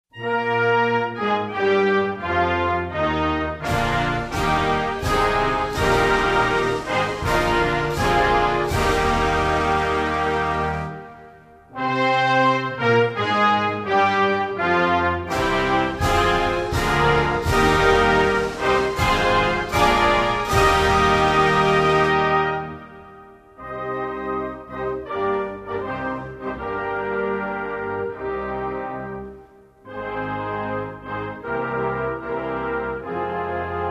Norway Hymne

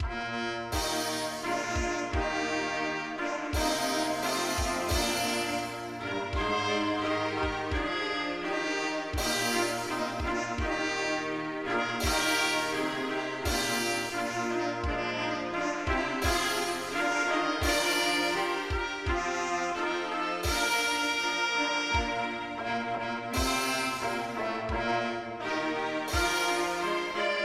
Island Hymne

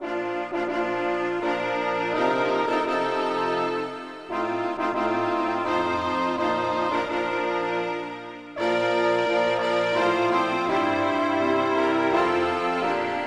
schweiz hymne

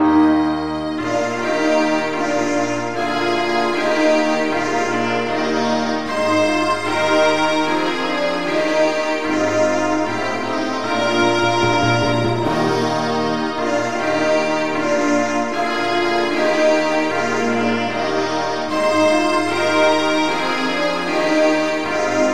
Deutschland Hymne

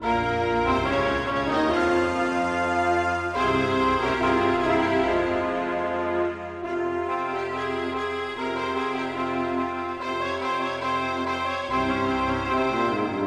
Marokko hymne

